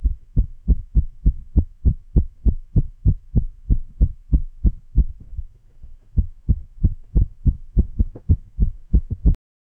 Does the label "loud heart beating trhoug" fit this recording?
Yes